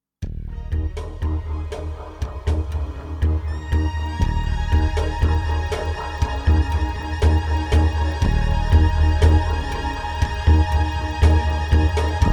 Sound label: slow lump with strings; Curiosities Volume I